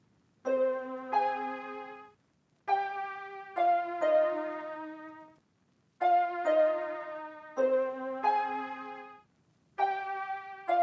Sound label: 135 BPM F Min Harp; Loop Stems; Cymatics; Agua